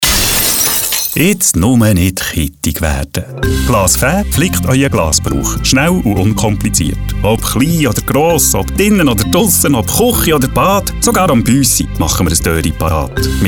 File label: glas faeh jingle